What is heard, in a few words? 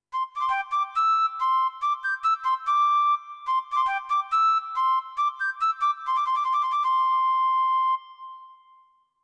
Piccolo